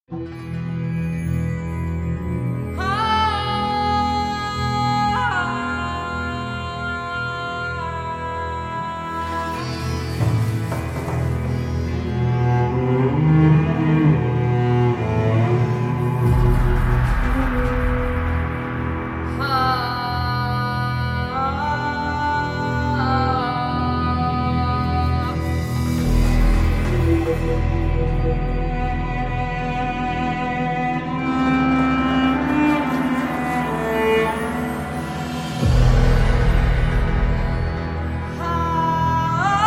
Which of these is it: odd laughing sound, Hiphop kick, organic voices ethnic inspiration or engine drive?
organic voices ethnic inspiration